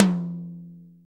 acoustic tom one shot f key, Royality free tom sound tuned to the F note; tom drum samples